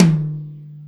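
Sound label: drum high tom; osu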